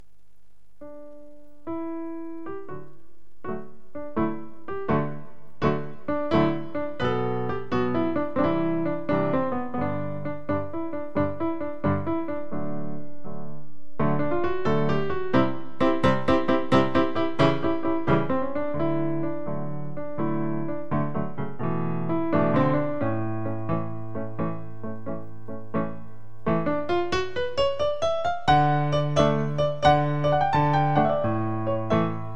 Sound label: piano